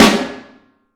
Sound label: Vintage Snare Drum